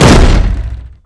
wood panel impact hard; physics, legacy

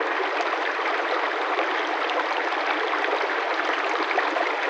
water run reverb; ambient

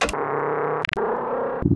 headcrab canister ambient, canals; Portal 2

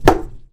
ceiling tile impact bullet, plaster; physics

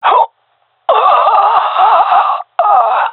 death, gendarmerie male; vo; agents

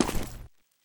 footsteps, mud; player

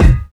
physics cube lg; Portal 2

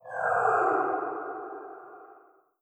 idle wet, headcrab reviver; creatures; vox

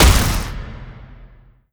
fall impact, zombie revived; scripted intro; Half Life Alyx

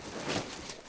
foot slide, zombie; Half Life 2

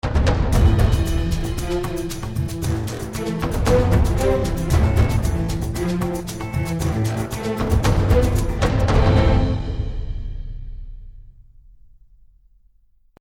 bomb ten sec count; valve; csgo

